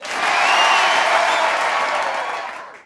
BMX crowd cheer; Crowds

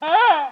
ANIMAL Goose; mono; Universal Sound FX

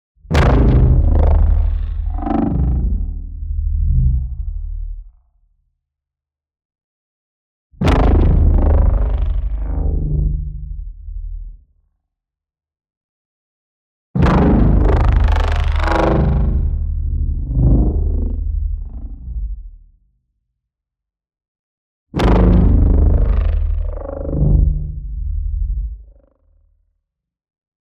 HIT LARGE Power Growl; NEON; boom library

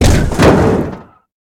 hit motorbike; Blind Drive

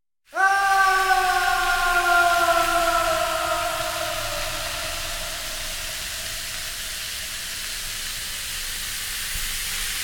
Falling into Chemicals, Scary Sounds; 1001 Sound Effects; Halloween Horror Sounds Effects

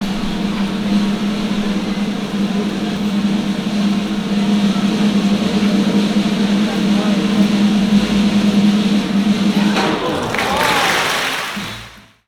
circus drumroll; best service Blue Box

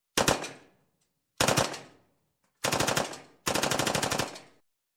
Gun, Machine Gun 45 Cal. Sub-Machine Gun; Sound Ideas 2000 Series

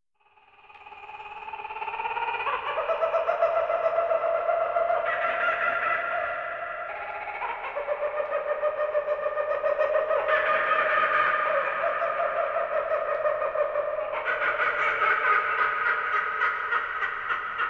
Kookaburra Bird, Scary Sounds; 1001 Sound Effects; Halloween Horror Sounds Effects

